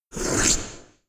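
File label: mushroom attack; monster; Senillinea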